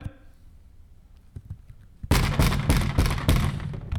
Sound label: Loud Knock On Door